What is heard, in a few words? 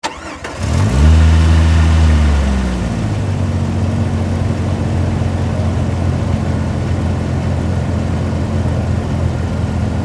Jeep Cherokee, Automobile, Laredo, Exterior, Start, Up, Idle, Shut, Off; Transportation; 1998; Blastwave